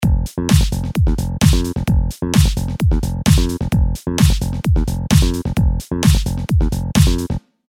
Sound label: bass